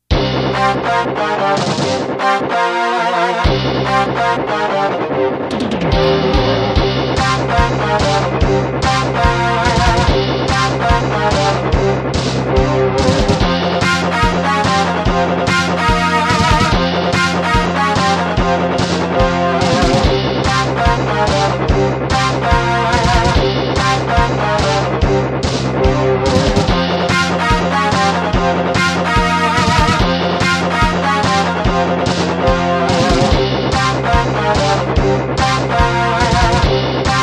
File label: Bass Battle